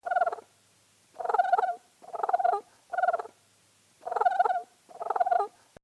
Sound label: purr